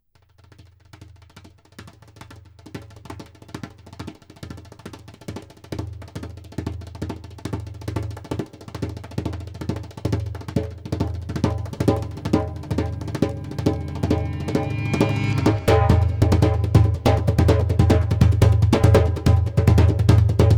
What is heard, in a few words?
Instrumental